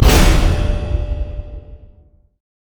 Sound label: feint shield; riven skin05